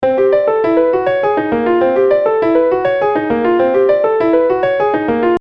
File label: Fifths Tone Row